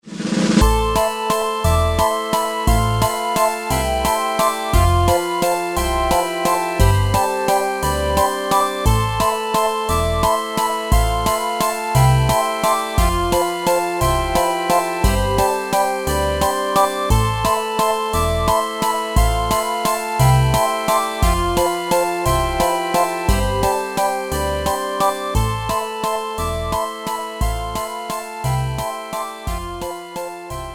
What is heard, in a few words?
circus